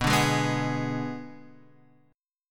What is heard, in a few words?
B Minor 6th B; Bm6 Chord {7 5 6 4 X 4} strummed